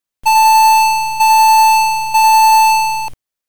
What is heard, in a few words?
pipipi